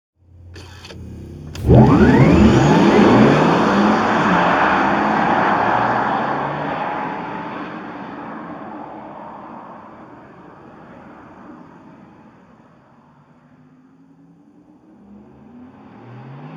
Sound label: porsche electric sport sound